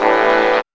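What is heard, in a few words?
horn chord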